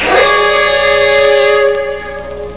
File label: siren